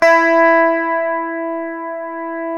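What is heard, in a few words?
guitar, clean electric guitar; Ultra Sheen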